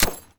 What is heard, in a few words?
laser end